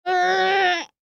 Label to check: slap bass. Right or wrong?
Wrong